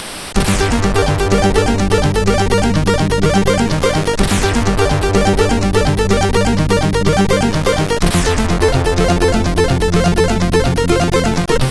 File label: MOS6581R4, sid